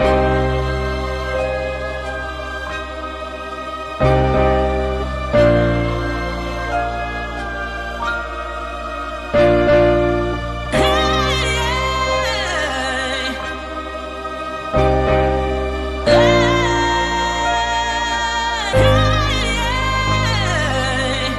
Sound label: piano and voice, sad loops